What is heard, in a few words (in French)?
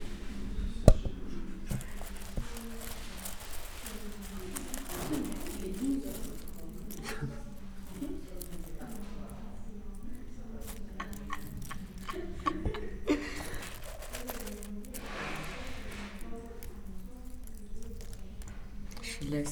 Graine de Pigeon; isba